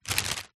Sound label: plastic bag carrier thick empty gentle handling movement; foley